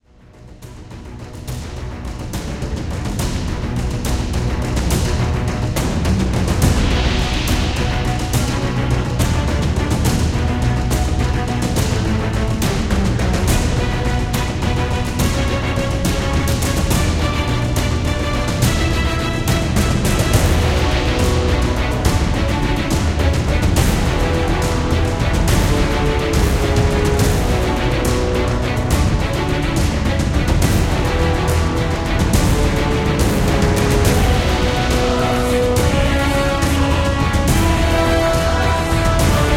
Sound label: epic trailer; HellSign